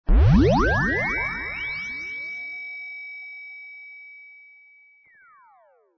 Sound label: Alien Appearing; RoboTutor